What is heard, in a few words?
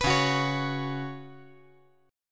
C sharp 7th Suspended 4th C sharp